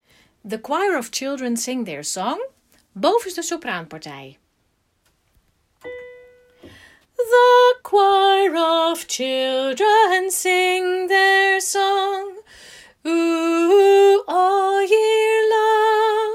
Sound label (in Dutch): the choir sopranen; kerst